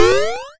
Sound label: FX Two Jump; SFX; YM; CHIPSHOP DELUXE; CHIPSHOP HITS